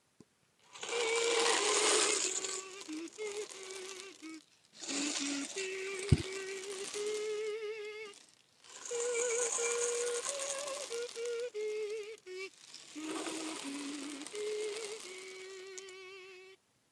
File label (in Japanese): petbttle